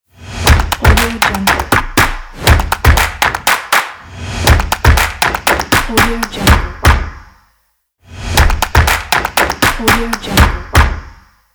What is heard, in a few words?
claps logo; soundranger